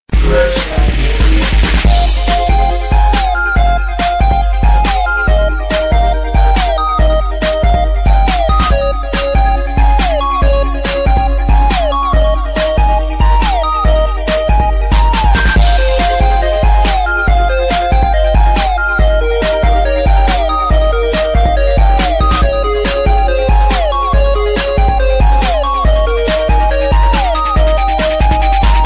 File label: Tetris Remix